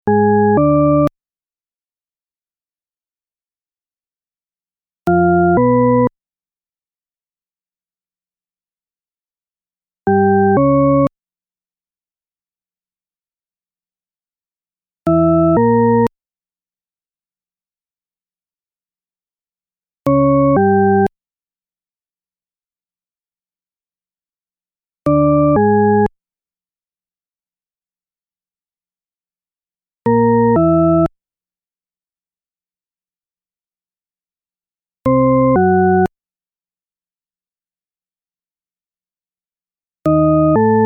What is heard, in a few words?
tritone paradox; diana deutsch